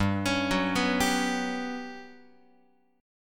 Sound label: G Major 7th Flat 5th, Guitar; GM7b5; arpeggiated; x,3,4,4,3,6